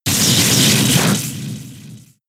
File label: Thunder Shock; pokemon sfx gen 6 attack moves xy oras